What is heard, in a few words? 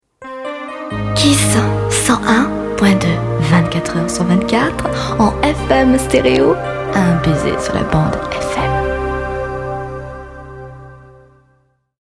Kiss baiser